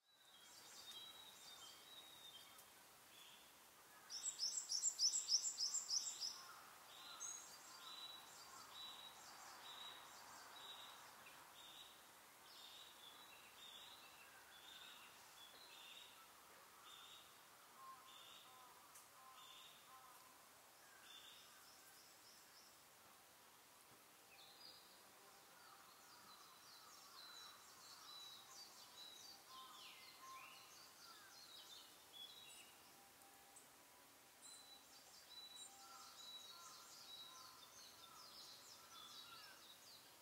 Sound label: One minute Nature Sound